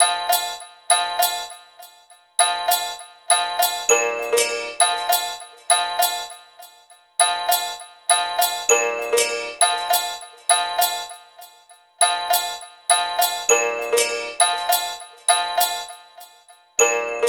Melody, Hip-Hop

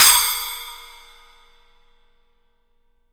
FX CYMBALS; Sampleheads; Peter Erskine Living Drums

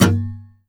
SCR, guitars; Spectrasonics; Hans Zimmer Guitars VOL-2; FNGSTYLE PFM

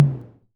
Tom Low, Korg KR-55B

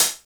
HH, Drum & Bass, 135 BPM; Ueberschall; Drum & Bass Flash Trackz

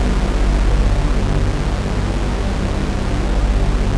JP8; Masterbits; Vintage Voltage

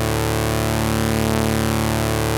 2600 A1, ARP 2600; Hollywood Edge - Giorgio Moroder Rare Synthesizer Collection

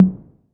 perc classic tom high

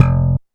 SP1200, DRUMMACHINES

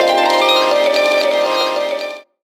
HRP TRAP, Gbm; Rawcutz - Nu Forms

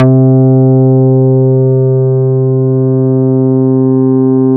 MINI ATTK C3, MINIMOOG; Big Fish Audio - First Call Vintage Keyboards

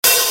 LINN OPHH

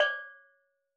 Steel Drums Layer D5, Calypso Steel Drum; Percussion

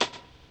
RAGGA; Spectrasonics; Metamorphosis; RAGGAVYNL

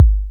dry perc, Quasimidi Rave-o-lution 309

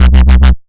Synths Root C

